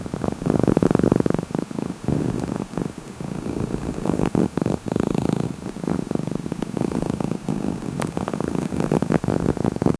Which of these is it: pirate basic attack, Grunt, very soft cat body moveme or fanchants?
very soft cat body moveme